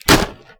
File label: Paper Explosion